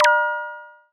Bonus Granted

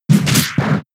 Low Kick; pokemon sfx gen 7 attack moves sumo usum

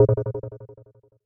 Menu Cancel

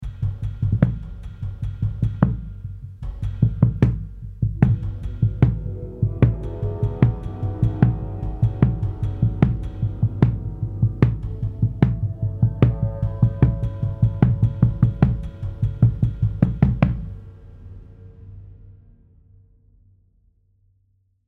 Drums Metal Harp Hits; Block 5

